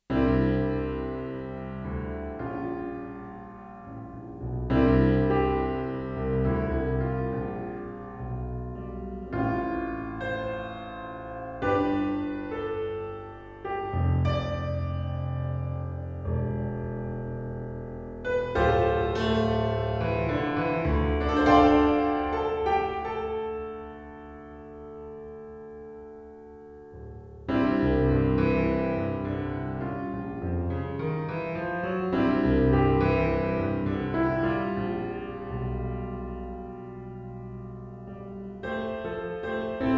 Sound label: piano pop